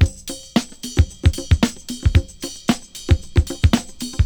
113 bpm drum groove f key; Samplerate 44100 Hz

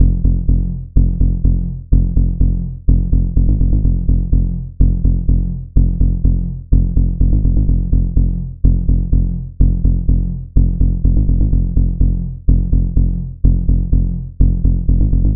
Tech House Bass Rhythm Punchy, F maj; instruments; loops

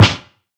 damped snare drum A key

